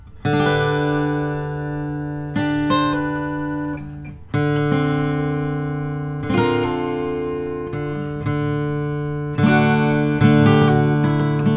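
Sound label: martin 000 42 standard series natural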